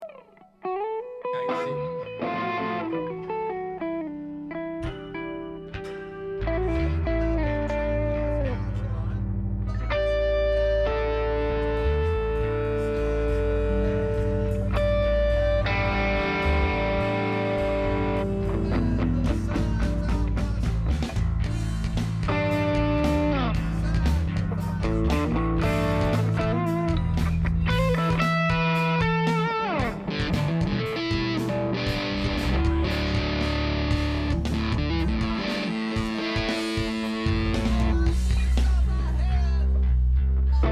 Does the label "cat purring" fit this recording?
No